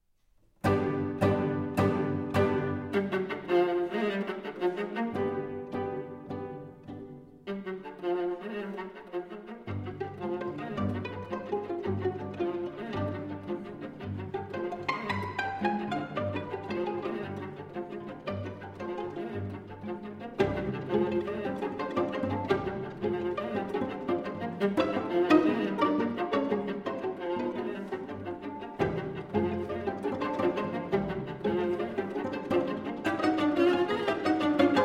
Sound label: String Quartet in G Minor, Assez vif et bien rythmé; Debussy; Op. 10, CD 91, L. 85 II.